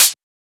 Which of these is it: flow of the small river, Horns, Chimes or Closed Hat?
Closed Hat